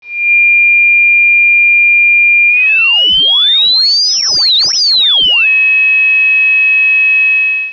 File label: aliasing sweep